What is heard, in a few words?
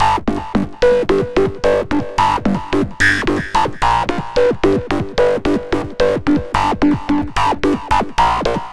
Reso Funk; Dr. Fink's Funk Factory